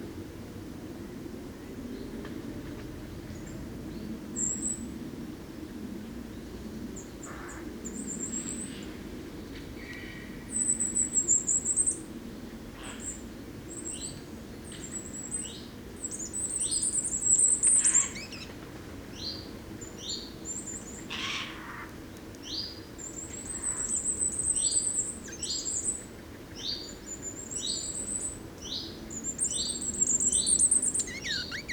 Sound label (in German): Sommergoldhaehnchen; Droessling STA; Michle Peron